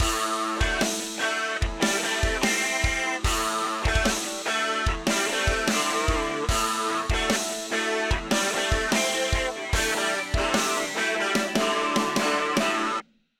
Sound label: everything with drums